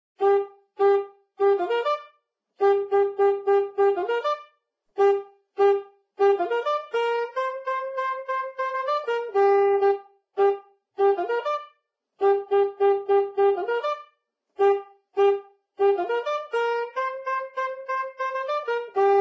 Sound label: Saxophone; Zomboid; Lifestyle